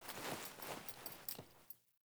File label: Foley Actions Wolf Jog Stop, Clothing; Characters; Player